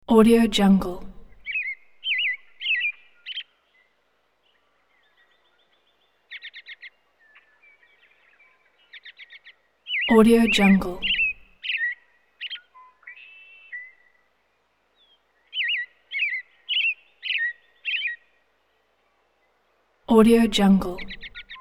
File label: american robin chirping; sound ideas